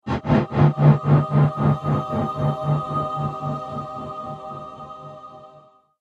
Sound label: Angelic Drum Impact; Miscellaneous Impact Sound Effects